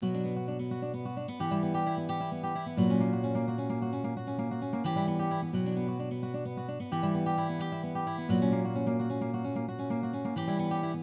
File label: Latin Trap Guitars wave guitars Loop 87BPM Bmin